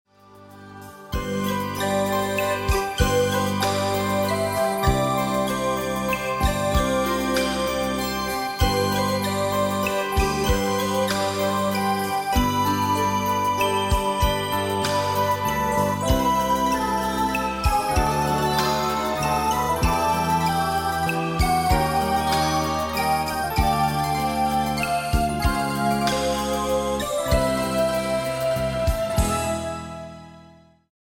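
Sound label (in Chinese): Karaoke, 兒童詩歌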